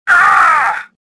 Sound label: death